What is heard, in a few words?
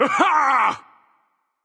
"yay"; smite; voicepack; poseidon